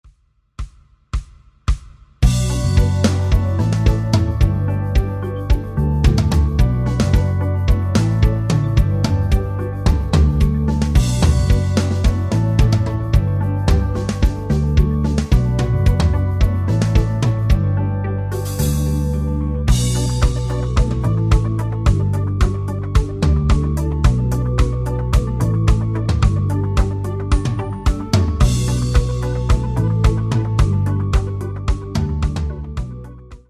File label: rhythm